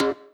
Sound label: Djembe, 128BPM; Multi Drum Loop 09